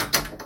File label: Locked Door